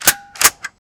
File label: firearm